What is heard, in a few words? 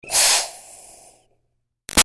Steam Release Iron Chuff Hard Hiss; Miscellaneous Household Sound Effects; Big Room Sound